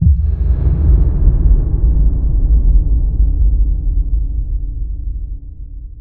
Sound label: echo slam layer; earthshaker; arcana